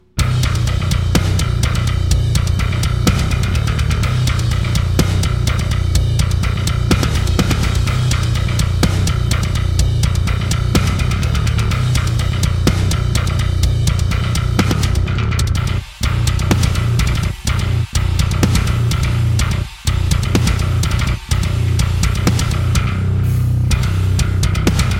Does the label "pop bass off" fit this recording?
Yes